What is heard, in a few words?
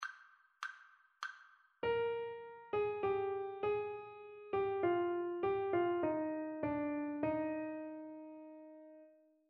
melodic dictation; eartraining